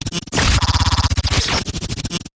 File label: Interrupted Static